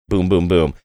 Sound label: boom boom boom